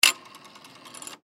Telephone Rotary Payphone Antique Dial Zero; Technology; Telephones; Blastwave

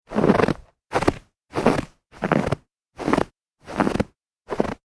human footsteps snow; Footsteps On Snow; McKinney Sound

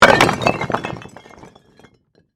Impact Rock Crash, Heavy, Break; Rocks; Materials

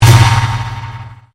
Convolution Pulse

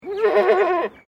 Animal Goat Baa; Animals from Edgar's Mission farm in Victoria; Goat Sound Effects; Goats (Sound Effects:Animals); Foundation1

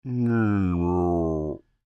Creature Speak Groan Med, Human; Groaning; BigRoomSound

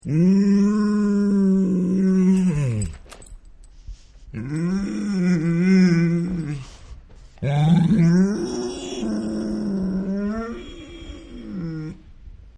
Dog Groans; Dogs; Animals

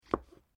MUSHROOM CUT; Various food preparation; Sound Effects:Restaurants; Foundation3

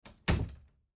Bedroom Door Close; Blastwave

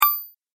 Menu Notify Single Ding; Ping Sound Effects

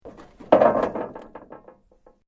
Metal Sheet Move; Material components to create more complex sounds; Materials

